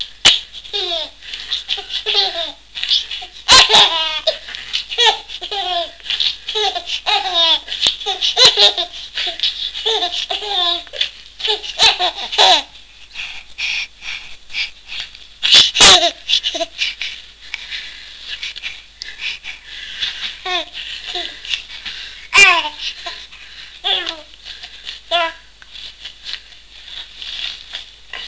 laughing

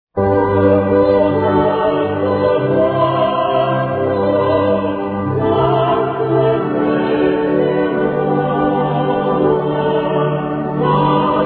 santo duo; coros solistas; schubert

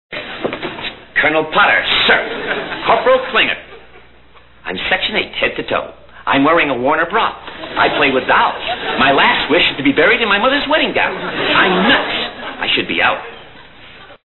mash

